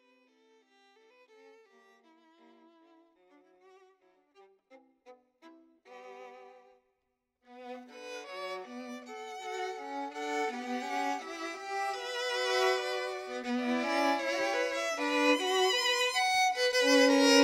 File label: duo chanson de matin; elgar